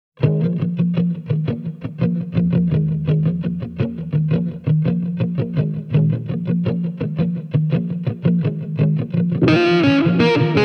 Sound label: halla custom hallabird guitars only